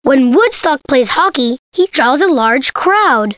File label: CROWD